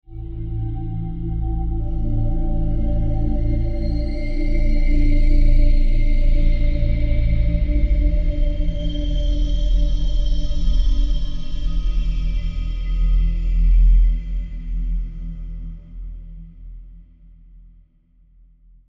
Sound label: creepy ambient